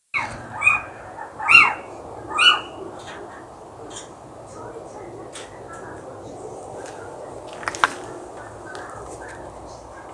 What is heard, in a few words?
Little owls alarm